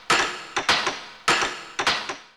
railrd repair; Opartofwar

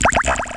DRIPITY, DRUM SOUNDS, EFFECTS

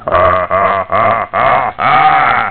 Ghost Laugh; The Haunted House 1.0.2

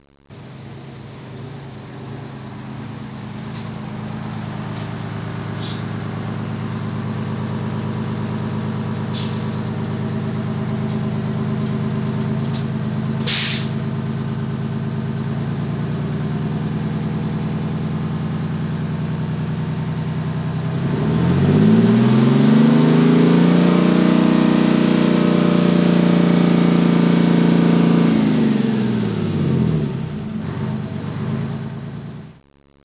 airbrk, fire; disaster

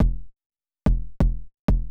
kick, house, 125, drum; eJay

